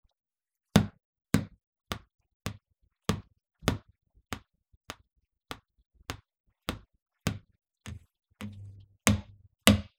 cloth

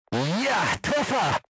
hit; tankwar

